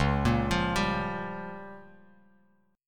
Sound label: Db 6th Flat 5th Bass Standard, Db6b5 chord; Db6b5 arpeggiated; JGuitar